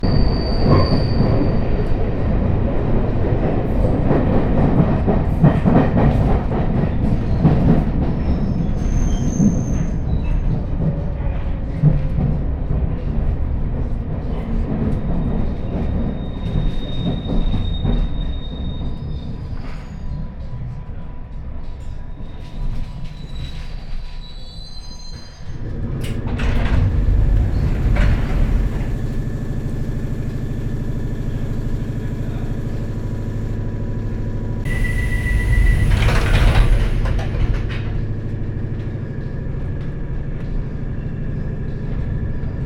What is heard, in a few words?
London Tube (District Line); Walkman Performance